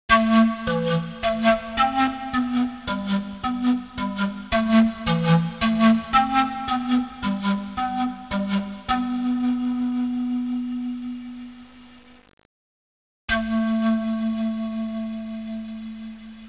musica strumentale

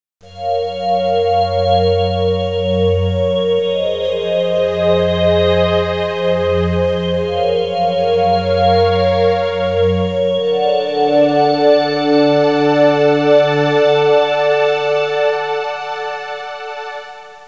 audio suonerie musica mistica

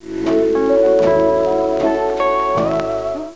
suonerie musica hawaiian

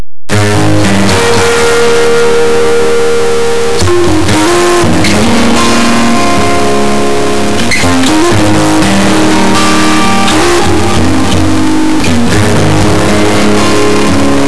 musica newage

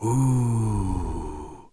OOOOO, urla; persone

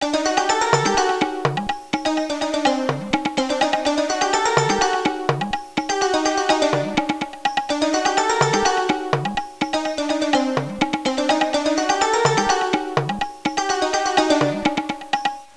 musica traditional est; fareast

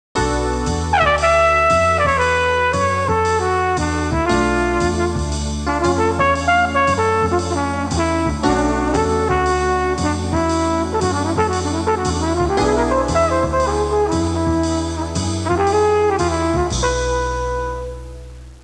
suonerie musica jazz

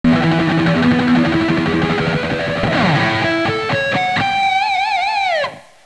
suonerie musica rock

